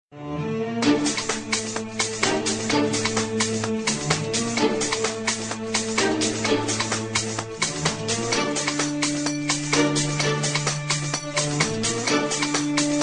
Arabian

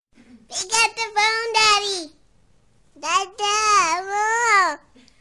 Kids

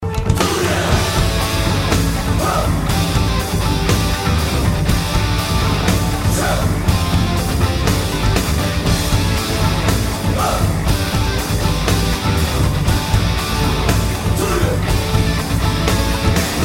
Guitar Strobe; Guitar Strobe 3

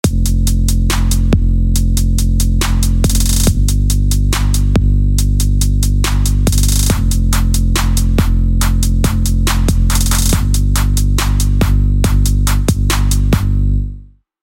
Trap Beat

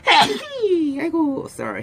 Sneeze

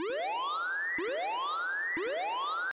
APX Tone Alert

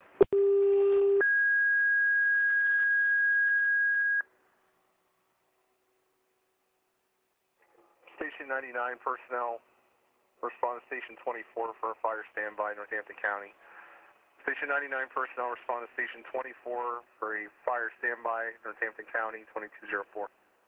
Dispatch

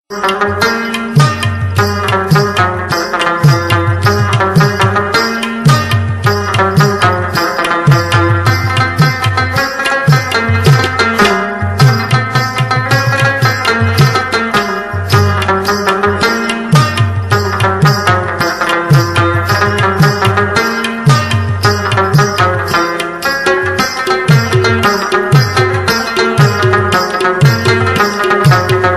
Pushto Rabab

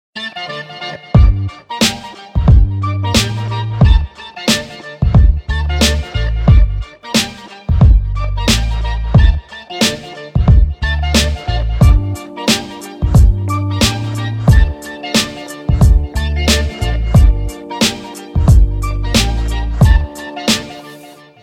Hip Hop Beat

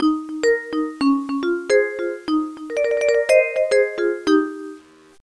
Ding Ding; Nokia

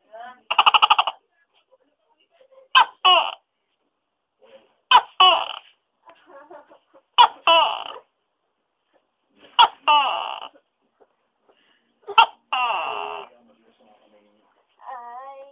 Sound Of Lizard

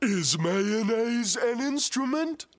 Instrument

